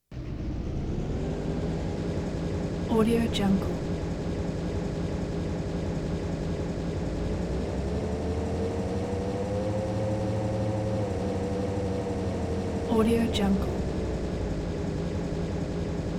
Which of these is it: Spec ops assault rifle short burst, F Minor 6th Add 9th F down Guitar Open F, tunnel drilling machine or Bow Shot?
tunnel drilling machine